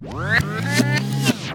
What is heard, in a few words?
Rewind